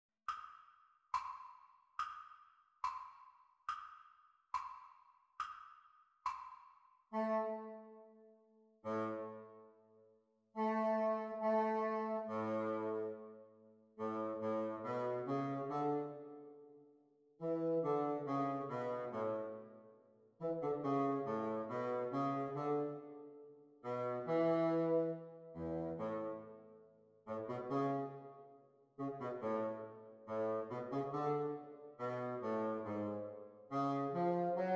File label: Bassoon Duets Practice speed